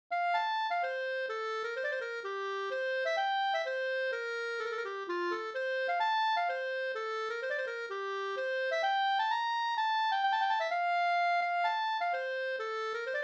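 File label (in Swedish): polska Bodapolska, F major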